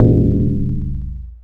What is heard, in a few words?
A#, Synclav FM PERCUSSION; Big Fish Audio